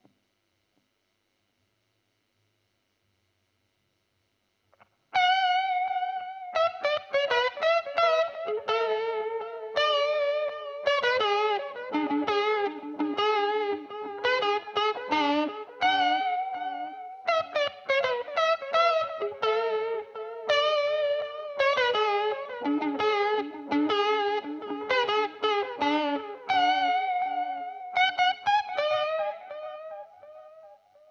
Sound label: GTR Royer